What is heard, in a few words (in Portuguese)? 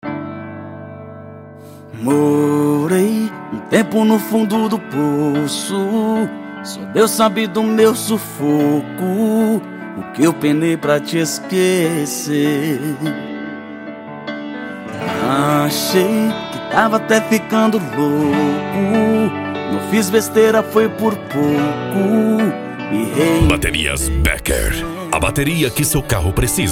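especial sertanejo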